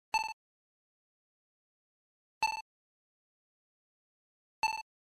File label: text scrolling; e.v.o. search for eden